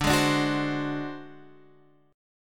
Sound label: D 7th Flat 5th D down Guitar Half Step Down